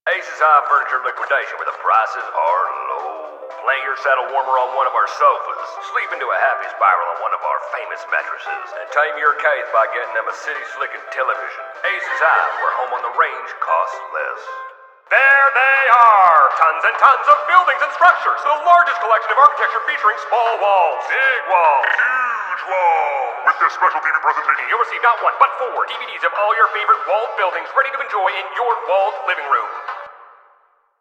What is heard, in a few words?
Television Audio; Warframe; Ambience; Nineteen Ninety Nine